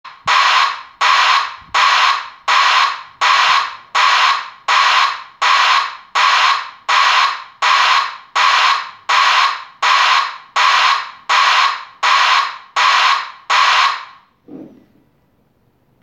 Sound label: squawker